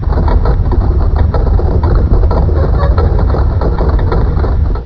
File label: creak